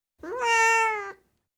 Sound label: Siamese, Individual Meows, Cats; BBC Sound Effects Library